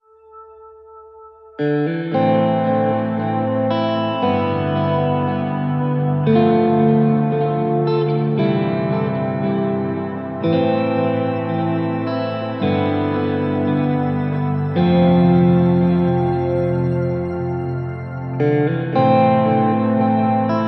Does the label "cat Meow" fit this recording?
No